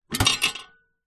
bread maker panasonic sd 2501 tin remove; household

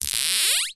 leisure toy buzz rattle magnets click vibrate

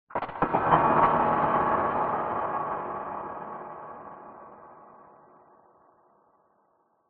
cinematic hit bright stutter intro; sound design

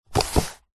household book slide in bookshelf; zapsplat

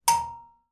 impact metal object hit metal ladder

